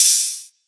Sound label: Hihat Open; Ableton Live 12 Lite; Core Library; Drums